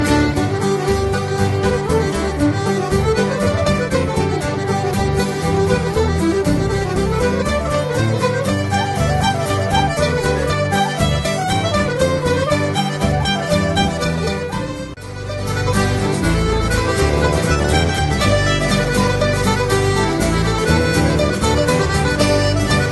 Reel Ceili instrumental

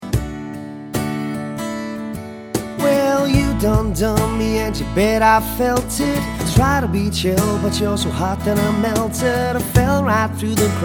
acoustic

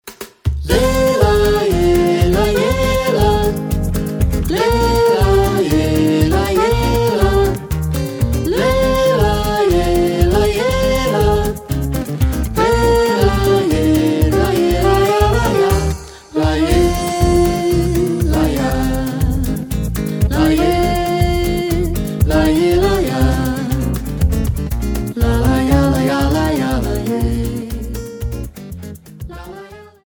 Samba

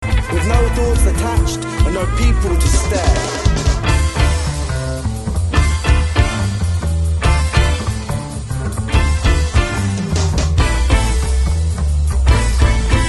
swing

